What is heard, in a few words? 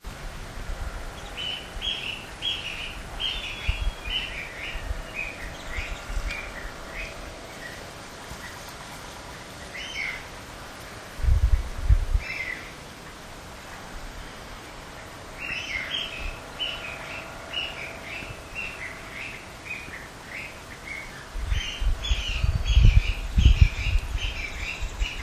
bamboo partridge